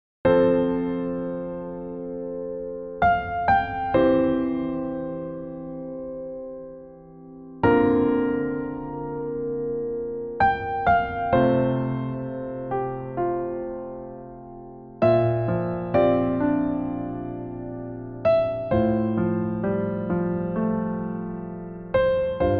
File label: Newage, 감성